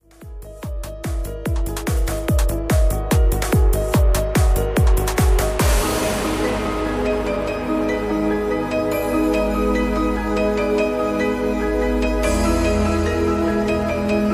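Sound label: electro banjo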